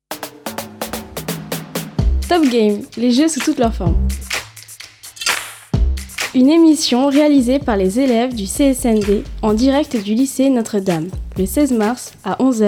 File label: Perc Jam